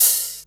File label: Open Hihats, Cymbals; Vengeance Samples